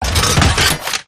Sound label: gunstand deploy; gow; turret judgement